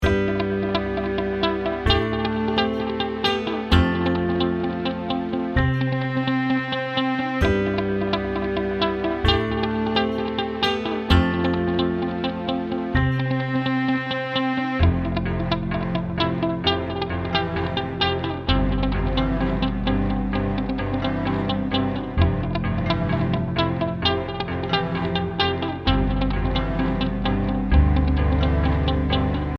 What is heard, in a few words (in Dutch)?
Corporate Cars LOOP ZONDER BEAT